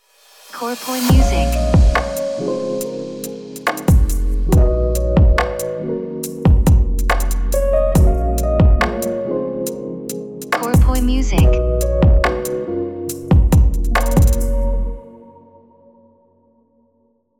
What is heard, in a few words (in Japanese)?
jingle ウォーター